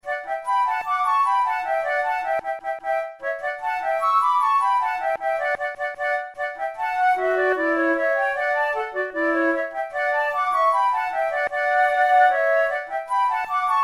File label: pour la flute duos allegro